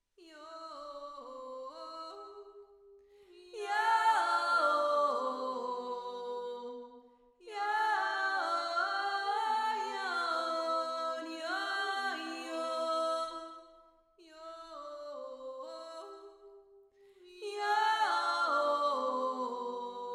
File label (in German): berglijuuz